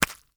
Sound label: GORE Stab; mono; GORE & SPLATS; Universal Sound FX